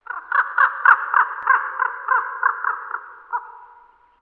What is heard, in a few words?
birds crow